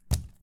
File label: Heavy cam drop; Phasmophobia